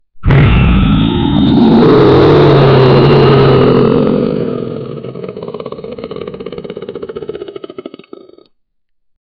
roaring sound, monsters; godzilla

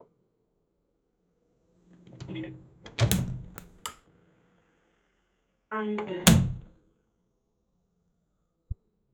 portal opening and it sta

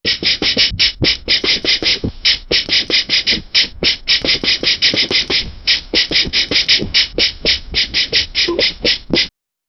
a ninja running

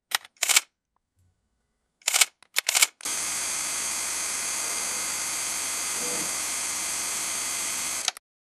press photographers, photography; 1940s

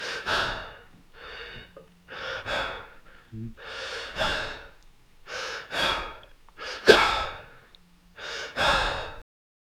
man breathing hard during, exercise

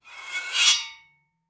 metal pole slide down, friction

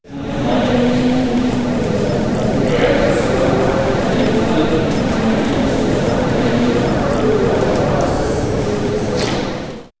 in a byzantine court, court

